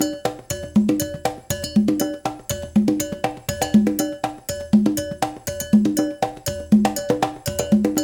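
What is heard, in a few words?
120 bpm, Classic Conga; Spectrasonics - Supreme Beats - World Dance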